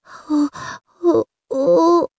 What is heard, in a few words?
gonome idle